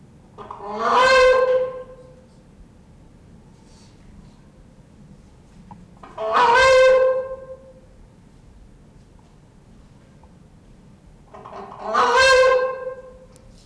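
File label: Balearica regulorum adult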